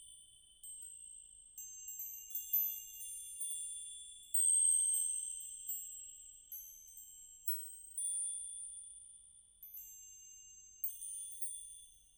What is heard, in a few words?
Camp, ambience